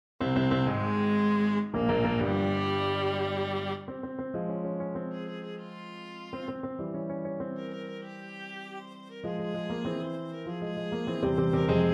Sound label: viola